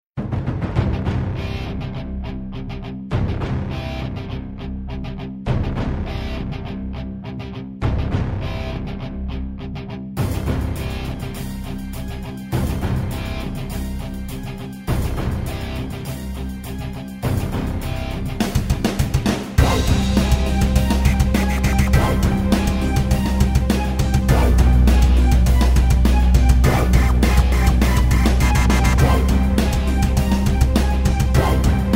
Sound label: music gem cave; iDaggers; Android